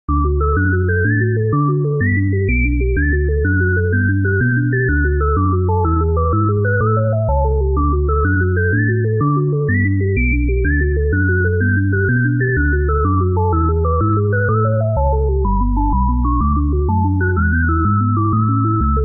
music button

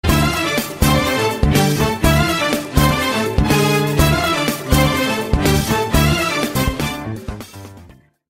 battle; persona 5

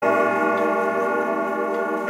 clock hitting noon

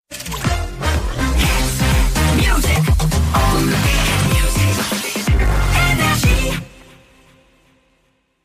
jingle; nrj 2016

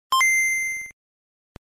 nintendo game boy startup

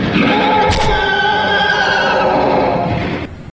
piggy jumpscare

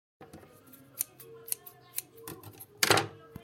scissor

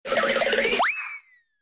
running away cartoon

